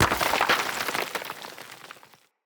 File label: Impact Debris Medium Dirt; Weapon Impacts